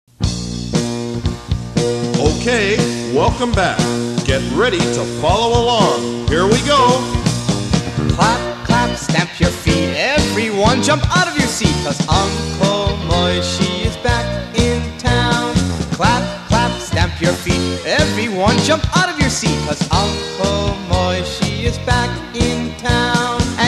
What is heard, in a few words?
clap clap